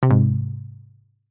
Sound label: multimedia notification alert prompt rubbery plucked negative; zapsplat